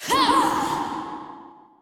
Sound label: Enemy Boss Attack Medium; bloom minigame